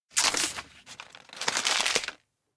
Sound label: paper peel grip; anemoi